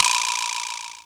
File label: Vibrasla, Roland MC-303, Percussion; Drum Samples